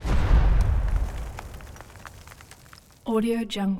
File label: explosives sweetners; soundmorph